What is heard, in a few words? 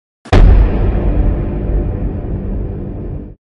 boom; Epic meal time